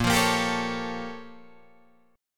A sharp Minor Major 13th, A sharp, down, Guitar, DADGAD